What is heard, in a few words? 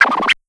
Sound FX Scratch; Temple of hades